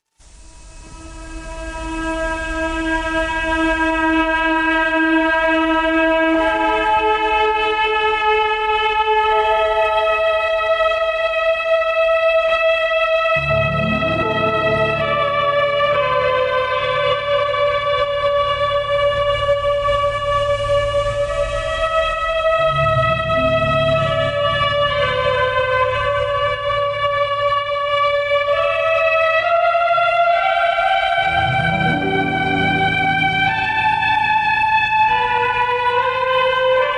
HARMONIUM; Pony Music Archive 19.03; CRUISE LINER 'DELPHI'

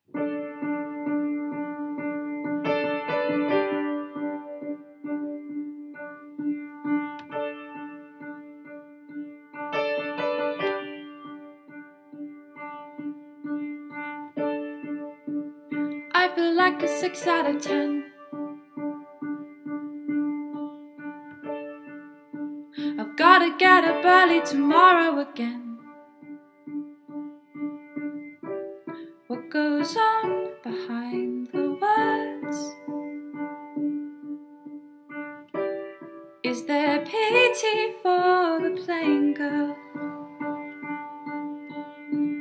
F, Cover, Piano; Cakeday special

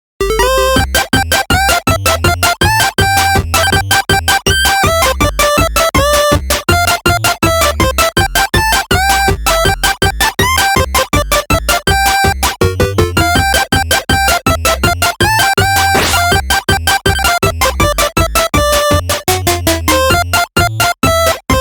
Singing Telegram (8-Bit)

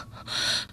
Grunt; Lewd SFX

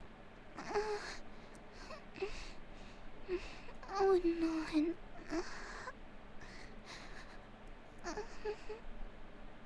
Moaning; Twilight Sparkle

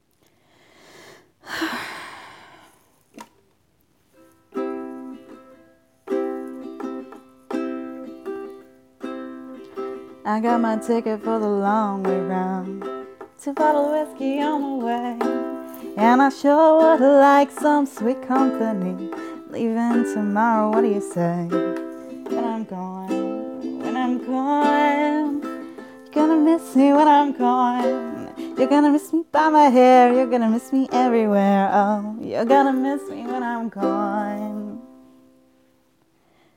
Music singing sfw

